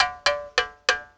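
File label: SM MOUTH BOW; Spectrasonics; Heart of Africa 2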